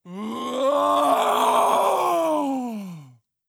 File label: Roar Goddess